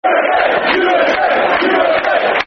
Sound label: Usa, Usa, Football Chant; Aston Villa Avfc